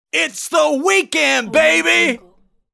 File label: its the weekend baby scream